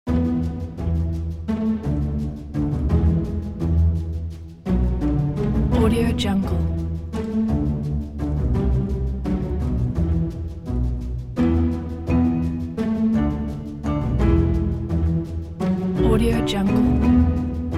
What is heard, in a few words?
funny cartoon